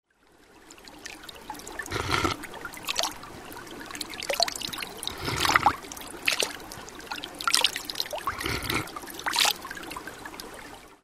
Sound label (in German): aus sprudelnden bach wasser